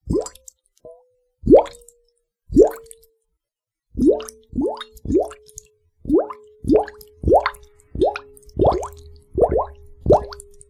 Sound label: Bubble Chain; Bubbles